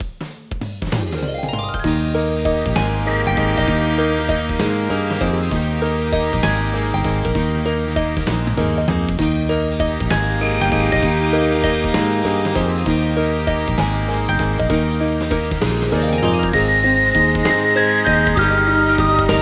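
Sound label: BGM Main Jump; Super Mario Party Jamboree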